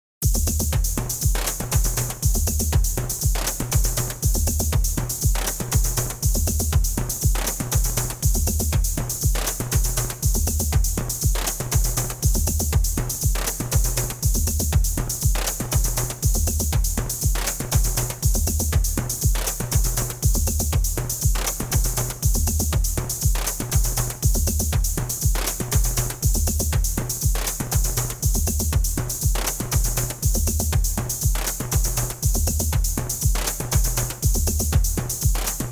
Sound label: Drums